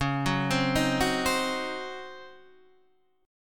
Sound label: C sharp Major 7th Suspended 2nd Suspended 4th C sharp, Guitar Open D